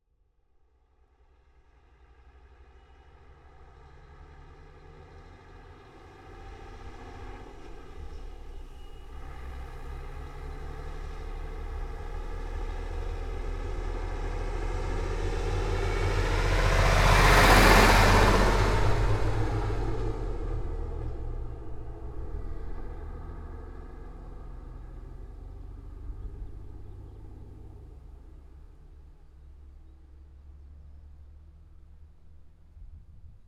Truck Ural Spot A Driveby Close Perspective, URAL 4320 Diesel; FLYSOUND